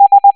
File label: s tone